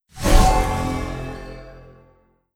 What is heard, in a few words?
Berserk Skill Spell